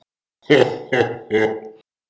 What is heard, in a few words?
Laugh; Talking Santa; Android